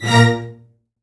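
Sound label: String Section; CMI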